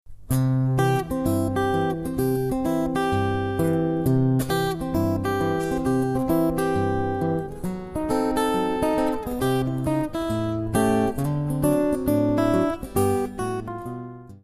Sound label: ragtime warm up